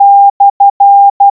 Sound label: oubun syasen, morse